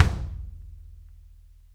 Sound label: KICK